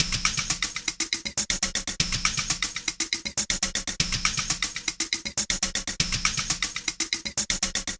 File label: dist loop 120 bpm